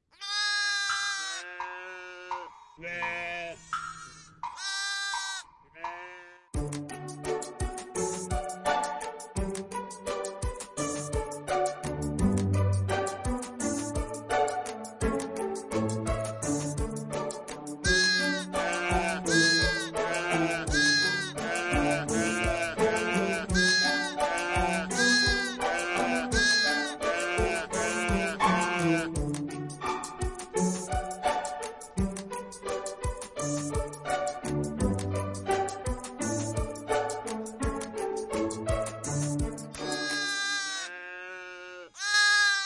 Baa baa black sheep accomp

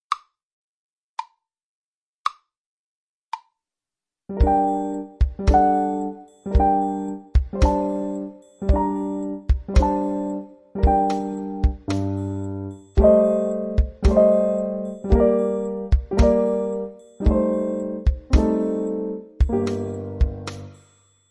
Winter night accompaniment

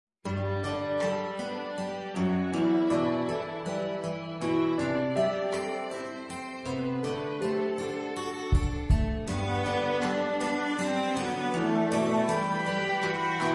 Lavenders blue accomp, viola

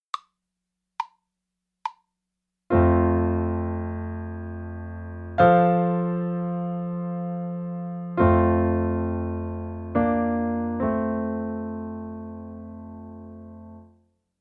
F major arpeggio accompaniment

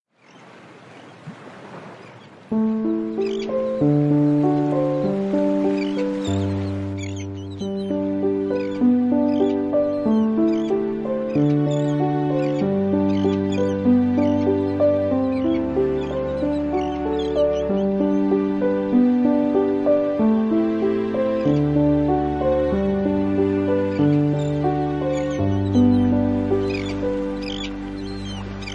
Plain sailing accompaniment, violin

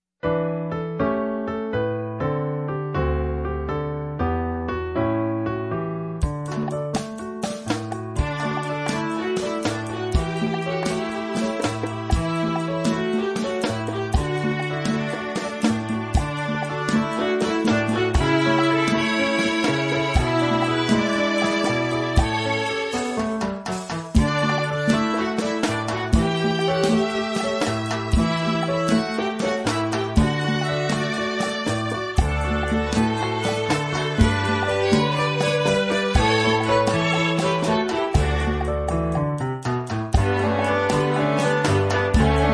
Rumba numba, viola

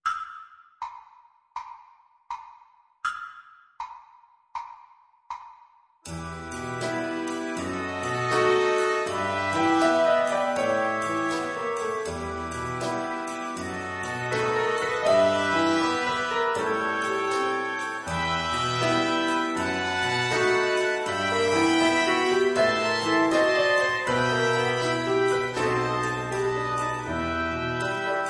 Low down blues accomp, viola; Hey Presto for viola bk 1 bronze

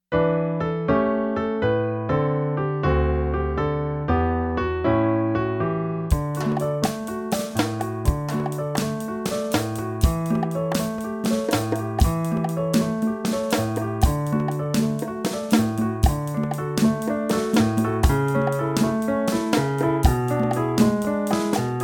Rumba accompaniment

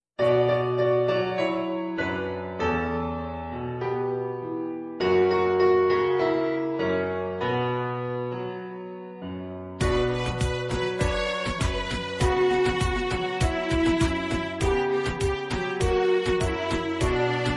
Cuban blues viola; heypr for viola bk 2 silver

